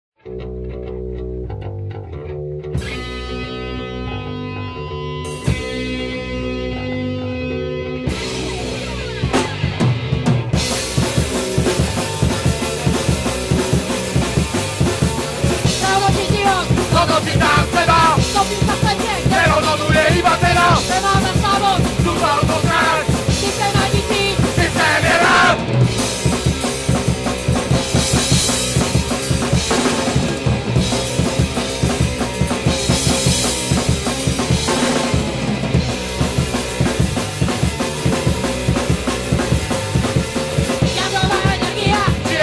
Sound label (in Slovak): HLAS